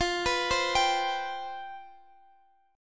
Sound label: C Major 7th Suspended 4th, F, guitar chord